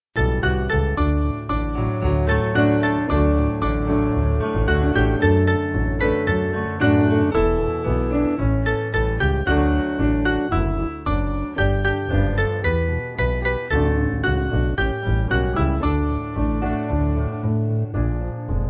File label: Piano